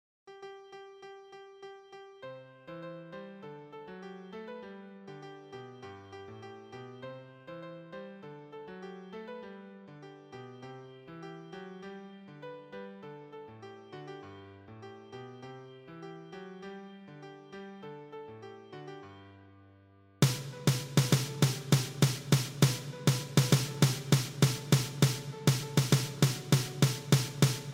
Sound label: PERC